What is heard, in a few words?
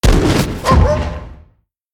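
giant hound spawn, monster; Senillinea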